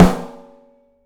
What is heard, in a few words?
OPEN STEEL SN; 3D Drums